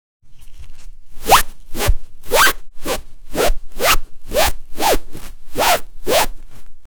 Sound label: sound of squeezing a wet, cleaning